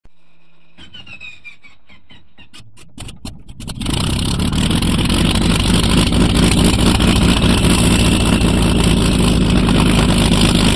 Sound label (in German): bf109 anlassen